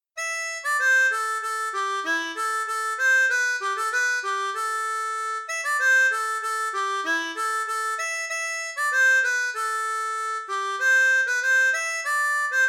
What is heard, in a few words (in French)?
L harmonica; Chants de marins